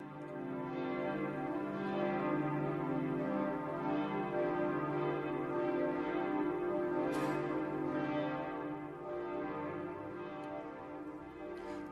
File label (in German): glocken; st lamberti